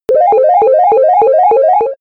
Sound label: arcade playing